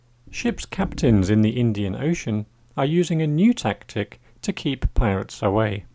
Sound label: loud music